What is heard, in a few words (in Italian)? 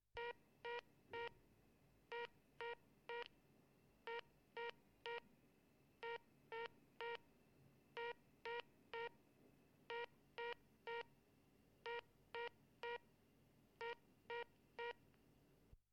TELEFONO OCCUPATO; phone